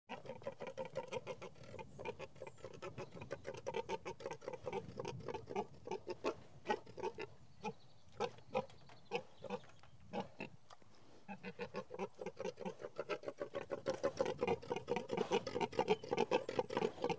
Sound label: Pigs In Field; Animals and Birds; BBC Sound Effects Library